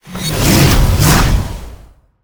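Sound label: Owl Bear attack; monster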